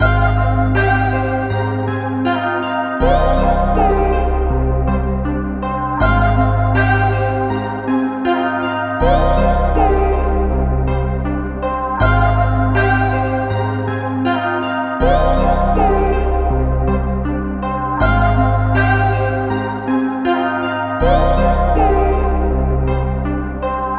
160 BPM C Min, MoogOne SubPhatty, analog hip hop melodies; Aquamarine